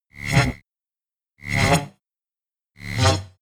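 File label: jam, electronic; comedy cartoon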